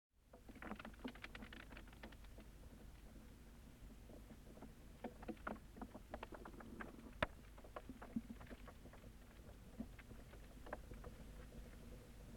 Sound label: Bird call dog